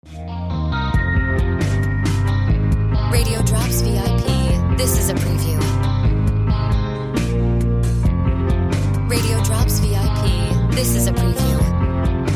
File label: Music Bed